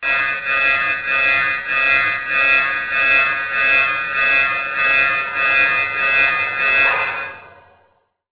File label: ringer; Ringtones